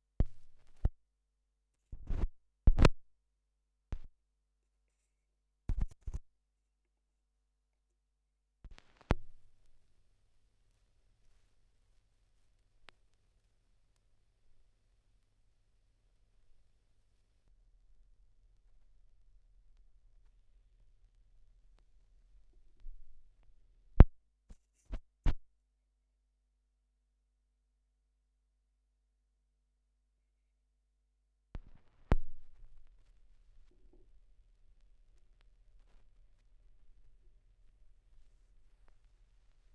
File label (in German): Schallplattengeraeusche, 16rpm; wendelrekords 1.2